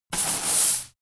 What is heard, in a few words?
broom sweep close